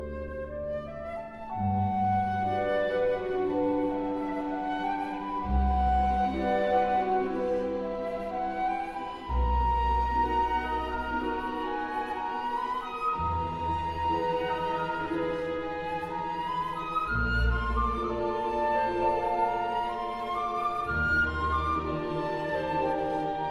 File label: love melody